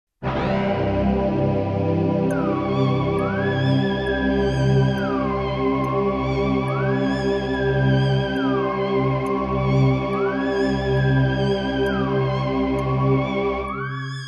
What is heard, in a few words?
joke music